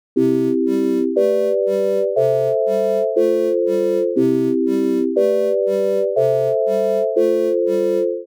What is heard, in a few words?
synth